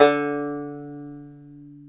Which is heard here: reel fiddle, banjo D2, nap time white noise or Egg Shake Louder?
banjo D2